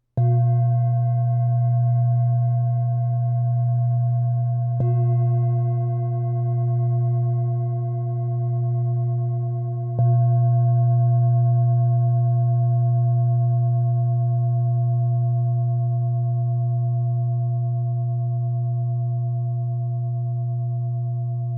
cerna tibetska misa

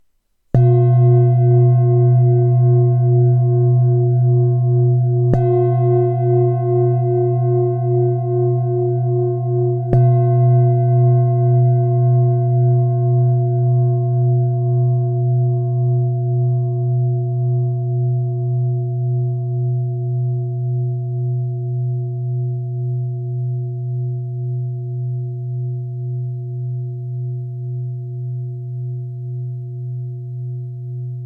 zlato hneda tibetska misa

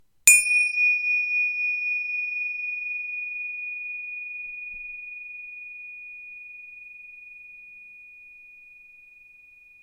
tingsa tibetske cinelky; s leptanymi budhistickymi symboly; 7cm; tingsaa

